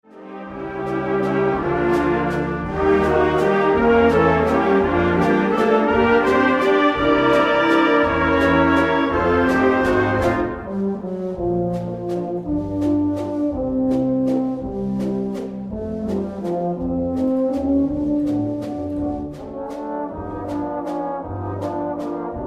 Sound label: great waltz; jamestown